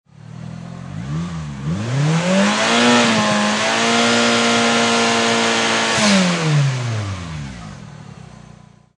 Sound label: Mitsubishi Eclipse Engine Long Rev; Auto, Power Car, High Performance Car Sound: Mitsubishi Eclipse. Engine perspective. Motor revving, Long rev